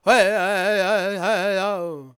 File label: G# MIN, LAKOTA, Vocal; ILIO; Vocal Planet VOL-5